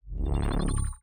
Drone Magnetron; TANK; 3Souls

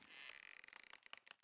ambience room lair work layer

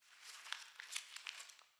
witch lodge book

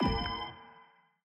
ambience room secret room artifact upgrade ready

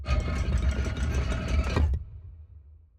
Wall Rasing Up; TANK; 3Souls

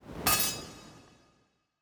ambience room forge weapon ready

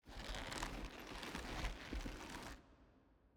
ambience room torture tripalium leather

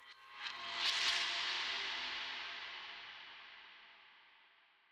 ambience room shadow room scary ambience

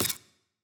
ambience room dark temple ritual stone ready

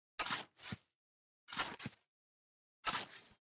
block digging up

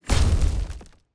Enemy Shields AoE Hit; TANK; 3Souls

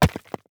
object drop stone

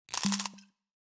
ui room alchemylab potion buy

